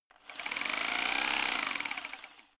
rock drill chisel short fast; maschinen; schrauben drehen hydraulik

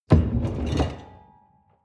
folding door open short

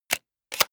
sci-fi cock plastic; comedy-cartoon; pistolen-gewehre

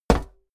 step walk metal solid; schritte

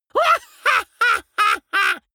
laughter spiteful; vocals; comedy-cartoon; soundpool

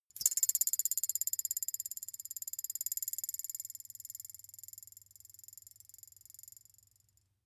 thin metal boing vibrate long

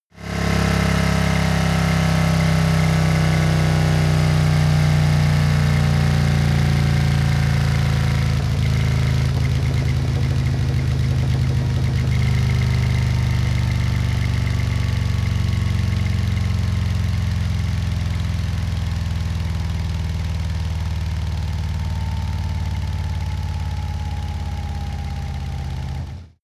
motorcycle harley decelerate long; motorraeder; verkehr transport

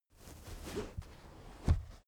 car armrest backseat open slow

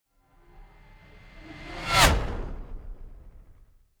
fly by hover car hum high

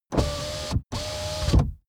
car window back close unsteady

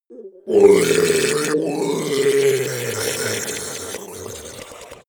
ice golem melt groan start; koerpergeraeusche; comedy-cartoon; soundpool

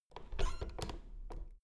door plastic handle pull up creak soft

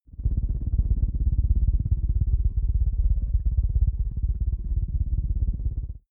space rotor rocket drive; raumschiff

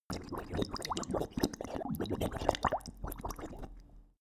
dog drink bubbling; natur-tiere; hunde

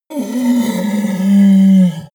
yeti groan pain

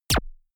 gun shot silencer synthesized zap; schusswaffen

